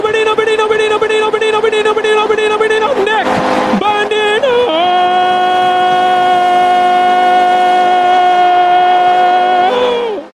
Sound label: Punjabi